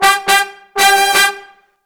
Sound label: Disco Fever, BRASS-LICKS